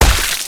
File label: flesh impact